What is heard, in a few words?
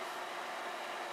carousel hum loop